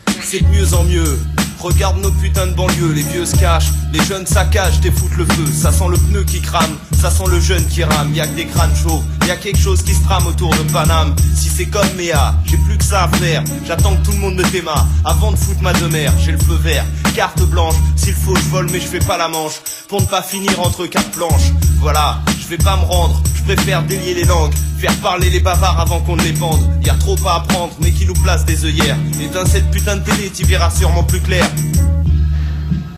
Chanson

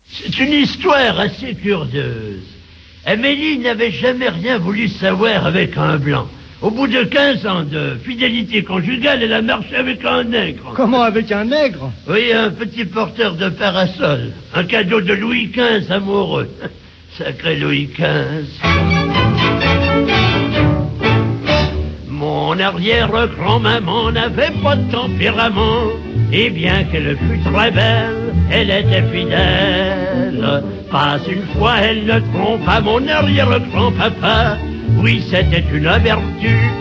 Chanson

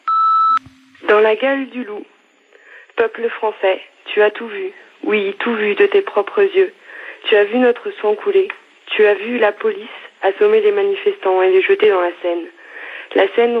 Chanson repondeur

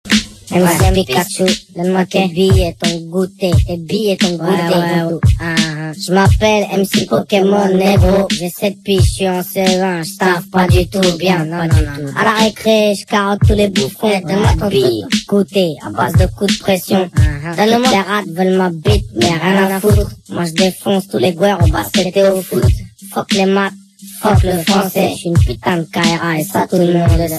Chanson rap de CE1

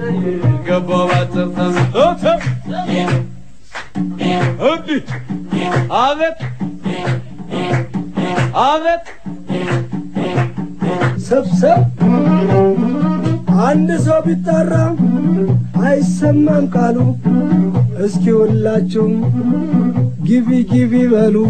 Chanson chanteur Azmari

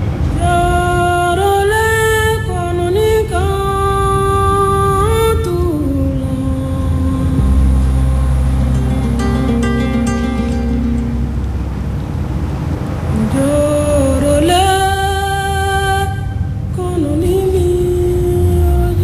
Chants africains

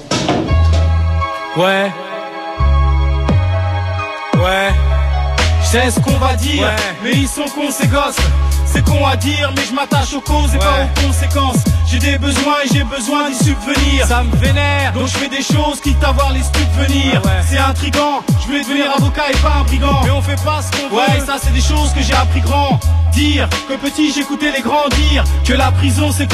Chanson rap

